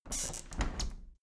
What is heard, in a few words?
window handle plastic squeak close regular; objekte; fenster